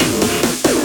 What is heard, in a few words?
Fill 140 BPM